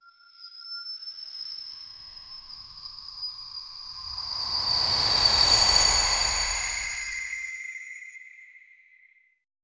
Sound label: dark magic shards moving